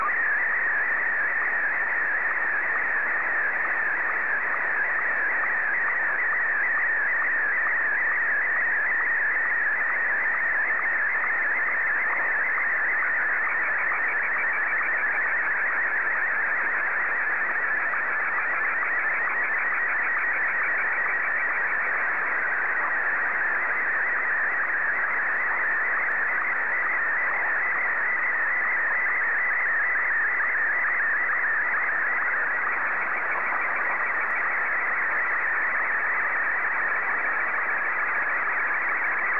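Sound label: SSTV, Martin 1; ZL2TJM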